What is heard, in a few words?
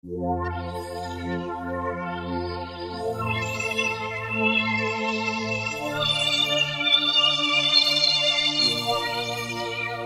Sweep, Yamaha TG500